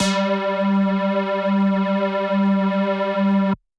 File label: Dirty Dutch Lead G2; Vengeance Electroshock Vol.2; VES2 Dirty Dutch Special; VES2 Dirty Dutch Leads